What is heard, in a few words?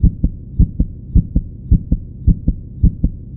heartbeat; SnootGame